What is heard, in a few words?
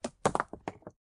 Rock Crumble